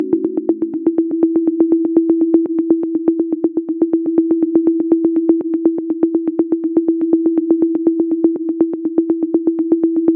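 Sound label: 122 BPM Sequence B major G minor Tonic E; innovative sequences 1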